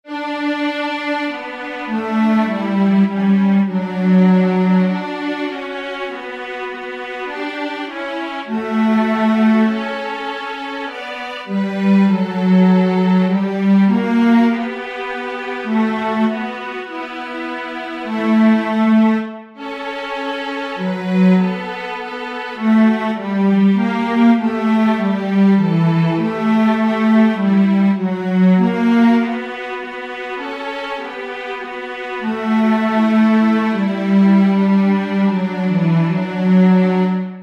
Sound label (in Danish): tenor; Dejlig er jorden